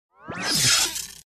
Electrical Shock Special FX

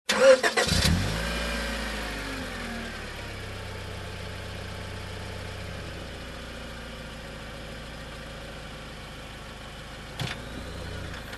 Car engine ignition and drive away

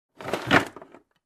Loose sliding door handling

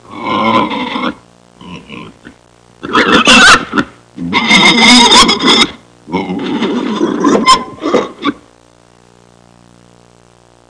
Stuck pig sounds

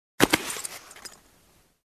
Stacked Cloths with belts slam

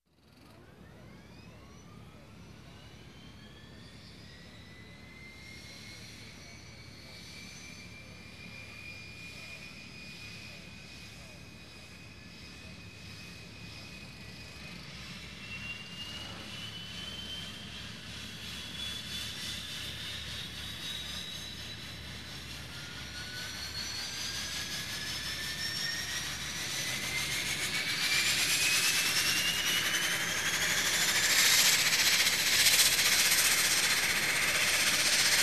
Turboprop Engine Start Up

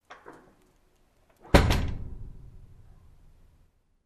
Solid Compartment Close

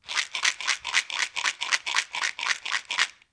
Shaker bottle sound

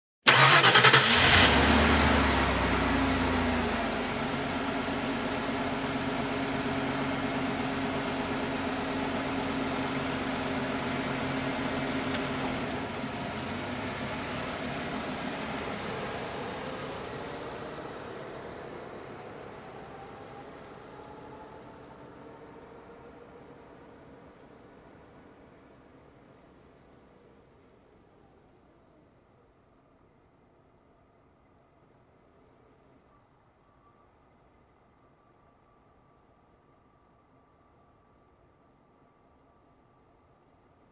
SUV engine ignition

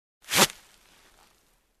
Pulling tissue

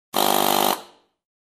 Wall Drilling

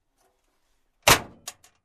Closing steel compartment

